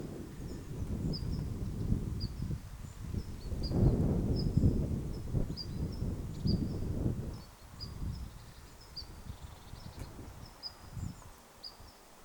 onkohan tuo niittymetsakirvisen huomioaantelya aanitetty pesimapaikalla tanaan

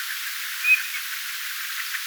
mika laji onko se vesilinnun soidinaani

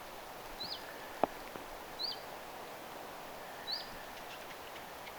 vif-tiltaltti

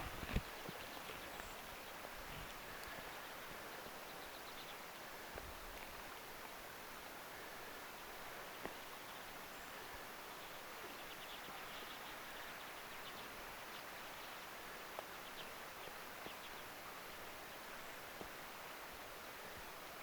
urpiaisparvi saaressa; 11 urpiaista saaressa

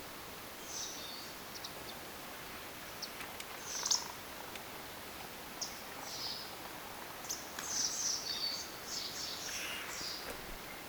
vastarakkilinnun aantelya; tuollaista västäräkkilintujen ääntelyä

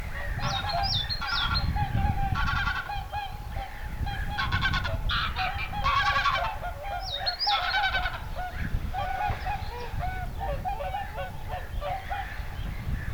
tuollaista kotihanhilinnun aantelya; tuollaista vähän erilaista kotihanhen ääntelyä

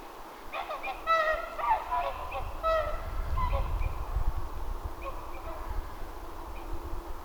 haarapääskysen pesäpaikan laulujoutsenperhe lentää ylitse; haarapääskysen pesäpaikan laulujoutsenperhe lentää ylitse, 1

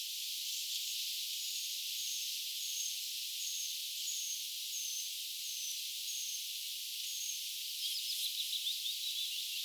nuori jo itsenaistynyt puukiipijannuori aantelee

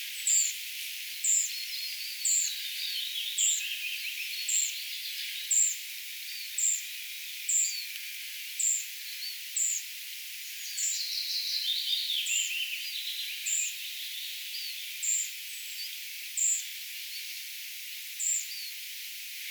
tietaakseni kirjosiepon kesan ensimmainen lentopoikanen kerjaa, kesän ensimmäinen kirjosiepon lentopoikanen ääntelee

